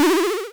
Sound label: pickup; spelunky 1 1